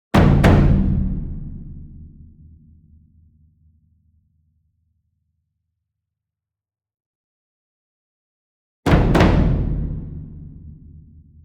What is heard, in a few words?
ENSEMBLE DRUM Bass Drum Concert Double Medium; boom library; Cinematic Strikes Construction Kit